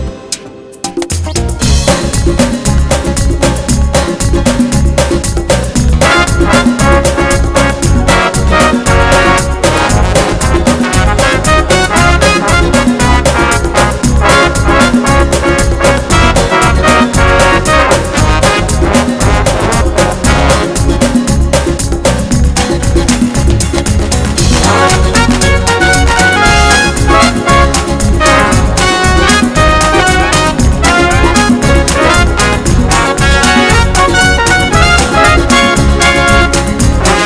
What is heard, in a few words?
Hot Country Rock